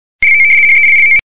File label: rtty75